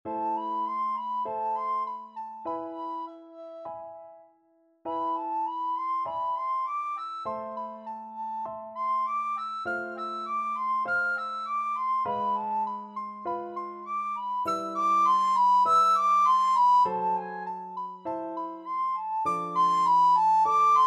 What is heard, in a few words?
vivaldi concerto, recorder